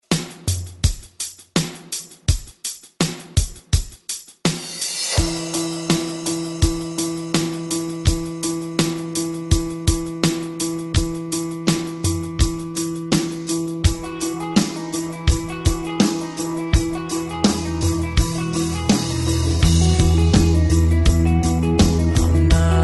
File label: Alternative Rock 88Bpm; DJDOLLS